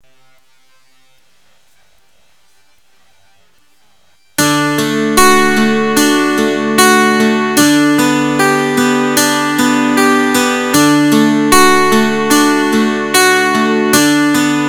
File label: E GTR POW CHORD